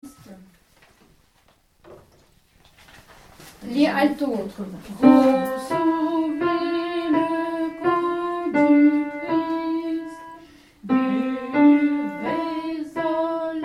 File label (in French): Recevez Corps du Christ Alto